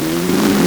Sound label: LSDj, Nintendo Gameboy; 300 Drum Machines